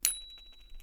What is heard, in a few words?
Bike Bell